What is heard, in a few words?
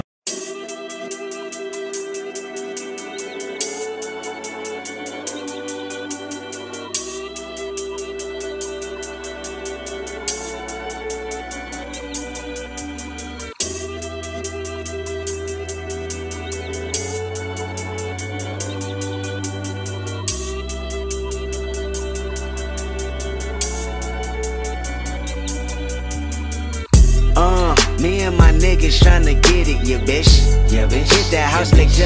Tik Tok Ringtone